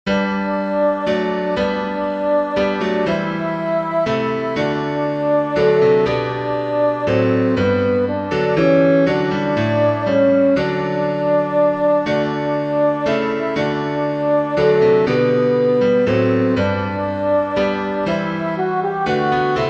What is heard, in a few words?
westminster abbey alto; Christ Is Made the Sure Foundation